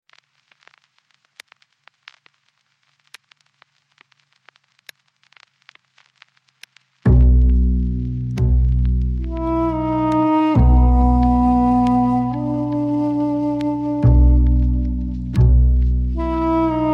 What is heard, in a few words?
duduk; survival theme